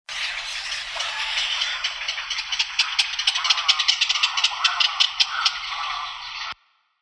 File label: Black Crowned Night Heron